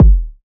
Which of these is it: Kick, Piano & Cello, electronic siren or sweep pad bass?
Kick